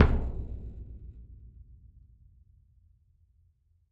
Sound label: The Big Island Drum; Sonic Foundry (Sony Creative Software); Joe Vitale Latin Percussion